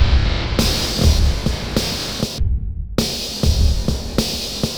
Overdriven Pulse Groove; Electronica; Composite Beats

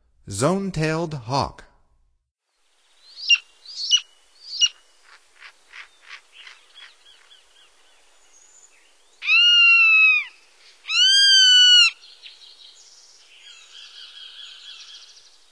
Zone tailed Hawk; North America; Stokes

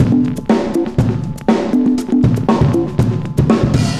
cooling 120, loops, 120bpm

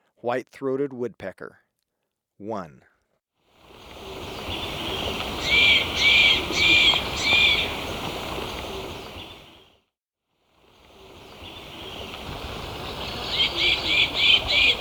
White-Throated Woodpecker; S. America

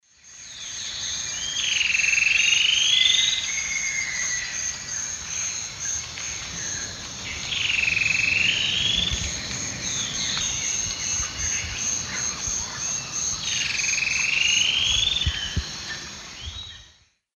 Deconychura stictolaema, song; S. America; Eastern Lowlands

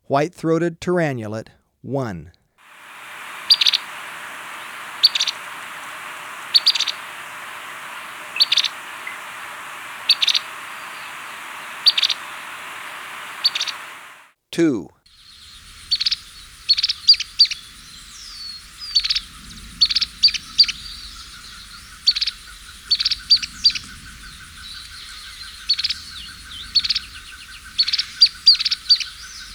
White-Throated Tyrannulet, Birds of Ecuador; S. America

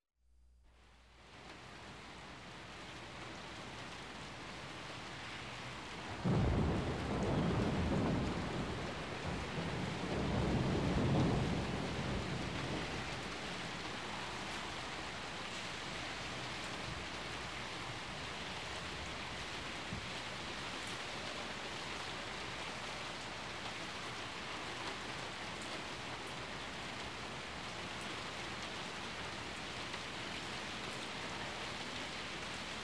Heavy Rain With Rolling Thunder; Nature Sounds; Sounds of Nature Collection

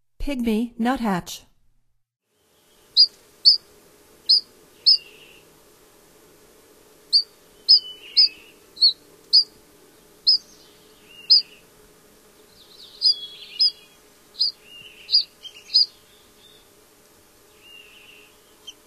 Pygmy Nuthatch, Songs of Birds of North America; Stokes

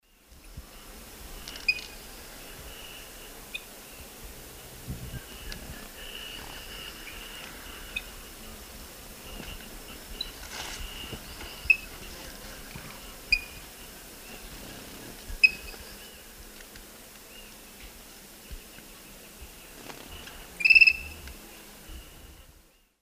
Hemitriccus minimus, calls and song; S. America; Eastern Lowlands

